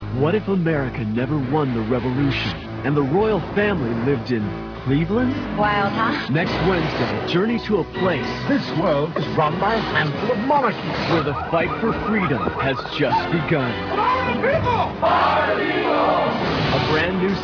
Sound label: wails